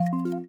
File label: combo